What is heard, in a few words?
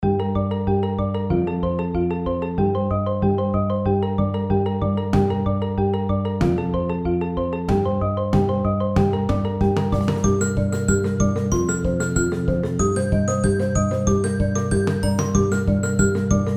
happy hardcore